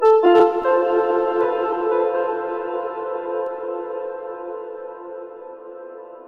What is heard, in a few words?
Dramatic Piano Piano Piano